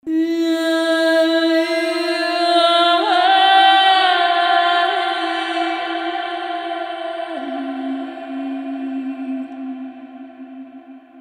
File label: Symphonic wet; machfive